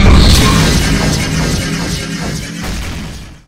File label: rocket spawn; skibidi toilets5